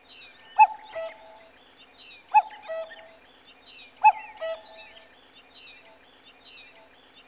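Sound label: bird chirp; EnvSound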